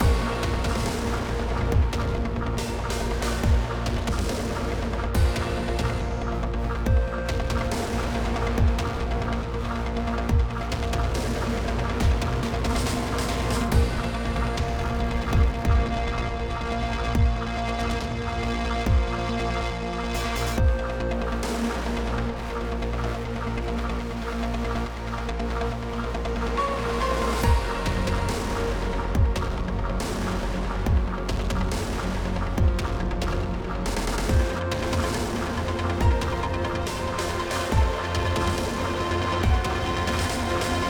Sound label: Combat 8CH 140BPM; Downtown Act2